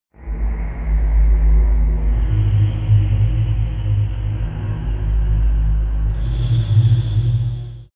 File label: inside alien shuttle